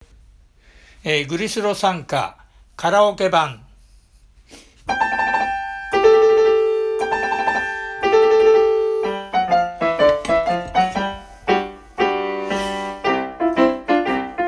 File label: karaoke